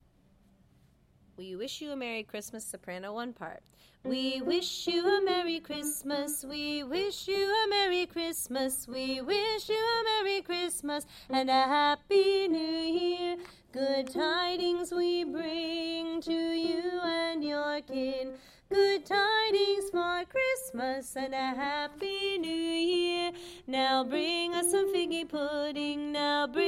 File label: We Wish Sop